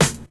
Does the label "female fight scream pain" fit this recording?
No